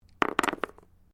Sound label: Dice Small Game Board Throw; Variety of dice sounds; Casinos